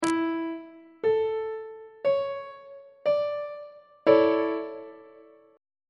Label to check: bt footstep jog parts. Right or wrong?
Wrong